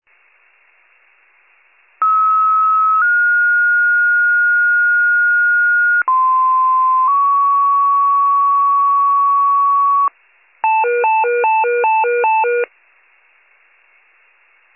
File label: FIRE pager alert